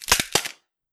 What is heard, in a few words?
cross explodes; sound effects and sound reinforcement; The Passion of Dracula